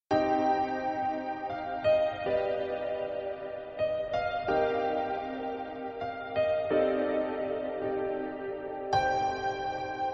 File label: Sax